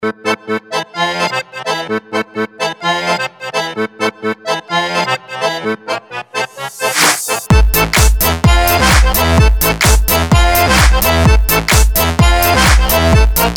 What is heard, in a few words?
karaoke version instr